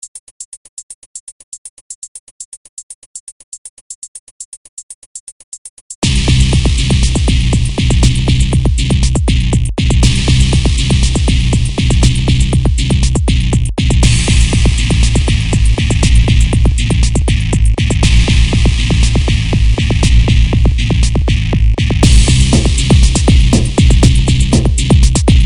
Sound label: Kickin Bass